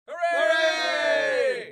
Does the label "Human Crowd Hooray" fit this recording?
Yes